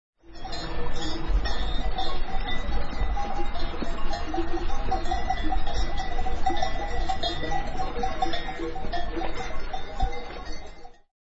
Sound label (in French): vaches montagne